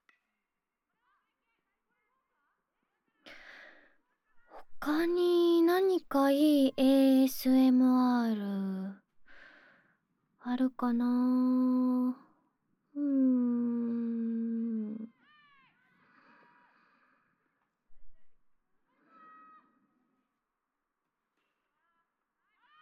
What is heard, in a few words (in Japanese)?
放課後、部活の喧騒 ～学校環境音ASMR～